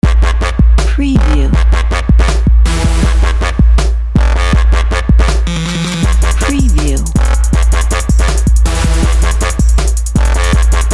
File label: trap logo